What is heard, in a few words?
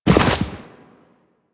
Walk Deep Snow, Footsteps; Warframe; Operator